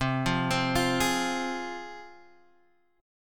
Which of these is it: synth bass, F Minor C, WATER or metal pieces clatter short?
F Minor C